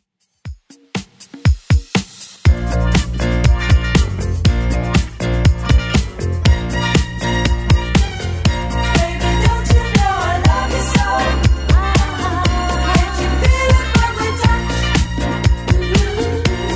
70s, 120bpm; Redrum

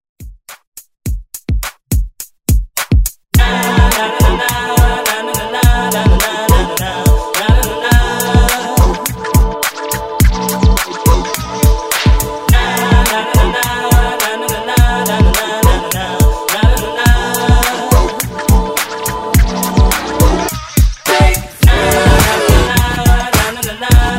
Top40; Redrum

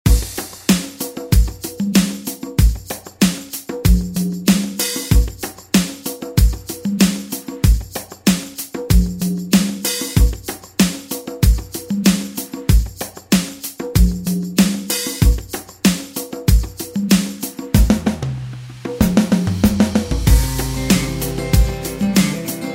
90s Re Drum

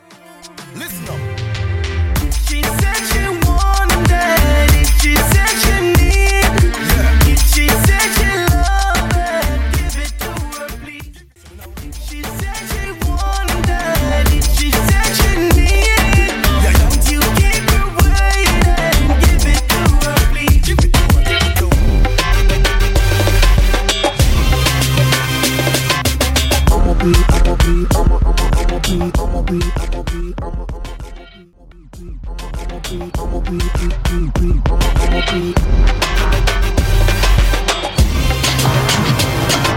Moombah Megamix 95 100

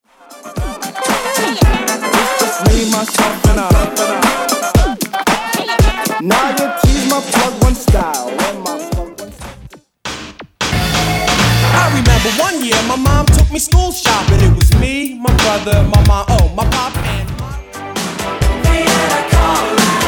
70s 80s 90s Classic Redrum

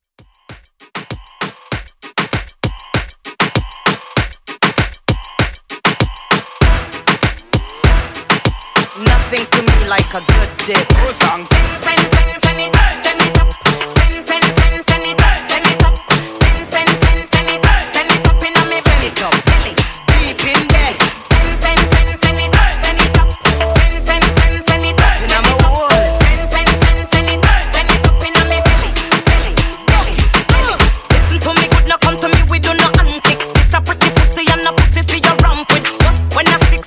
Reggaeton, 98 Bpm

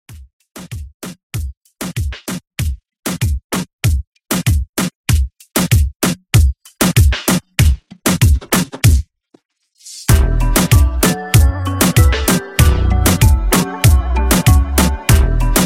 Reggaeton 96

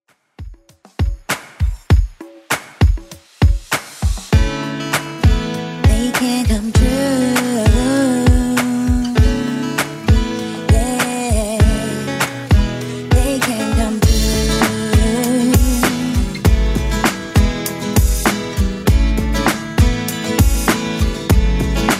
90s, 99bpm; Redrum

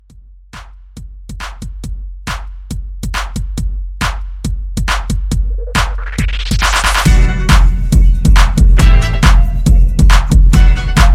Bmore Re Drum 138

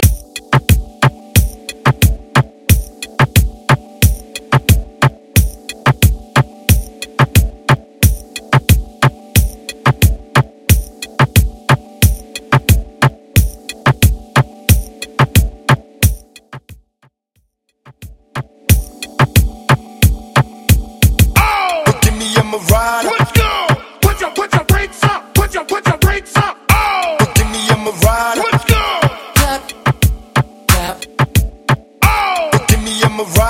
Top40 Urban Latin

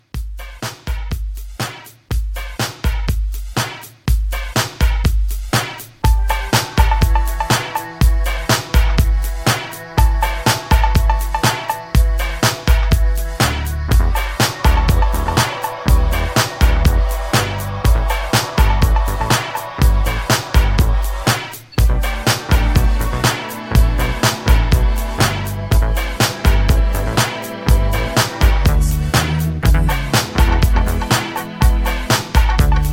80s Pop Re Drum 122 CLEAN

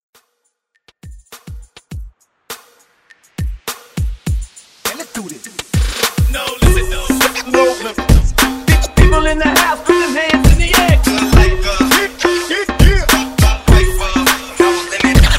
Hype; Redrum

